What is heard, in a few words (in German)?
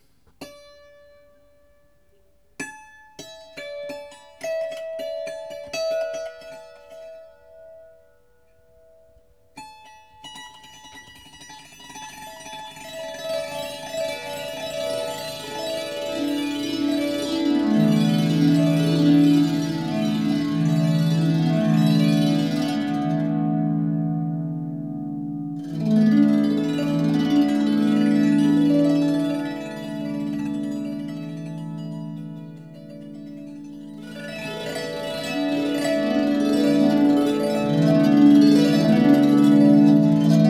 Kleine Tao Klangkabine Klangbeispiel